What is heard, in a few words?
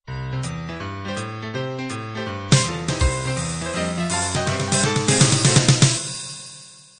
boogie woogie intro